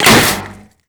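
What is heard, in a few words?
bullet impact paint metal; Modern Warfare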